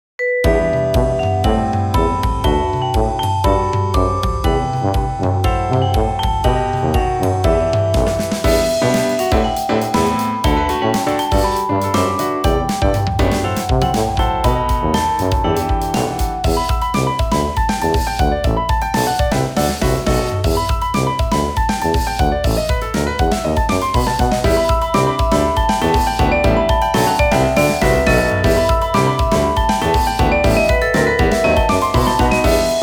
mountain breeze

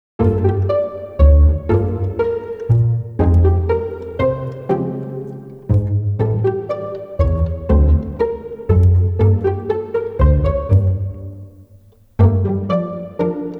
pizz

